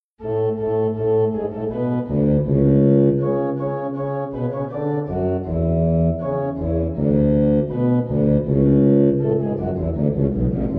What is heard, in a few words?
Magnificat Instrumental; Bach